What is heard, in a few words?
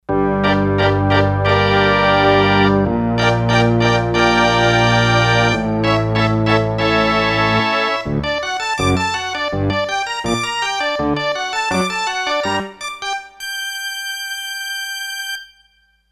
Str-organ; Factory Patches